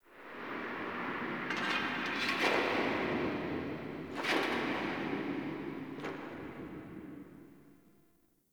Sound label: Warrior Echo, Crypt Ambience; One Offs; Champion Crypt